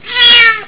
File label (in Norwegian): katt